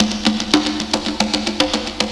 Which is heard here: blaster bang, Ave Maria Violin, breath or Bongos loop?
Bongos loop